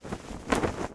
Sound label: Sail Wind; Civ4 beyond sword